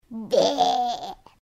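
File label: little girl is disgusted "be"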